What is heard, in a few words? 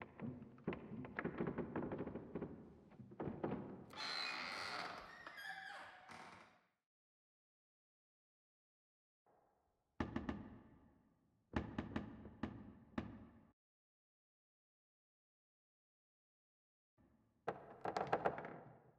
Office Wood Creaks; Silco